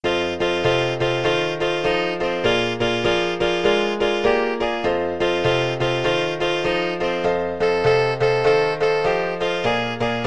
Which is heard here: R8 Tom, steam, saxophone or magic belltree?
saxophone